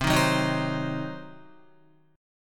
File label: C Diminished C, Guitar 4F,4A,5C,5G,5C,6E; strummed; JGuitar